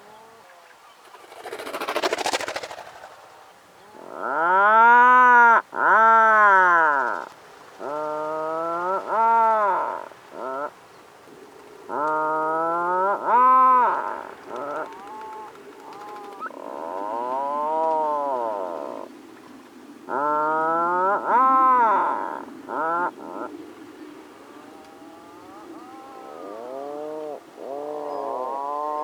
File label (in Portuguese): Canto do papagaio do mar